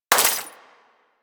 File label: Bullet Impact Debris Glass; Warframe; Weapons